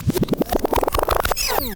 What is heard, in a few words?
FX; AMG Megabass REMIX! VOL-2